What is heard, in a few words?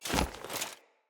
Grunt Foley Roll; Enemy; Sinecure; Melee Grunt